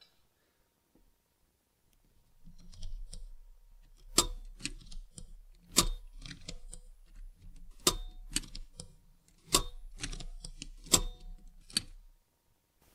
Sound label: keyboard, asr33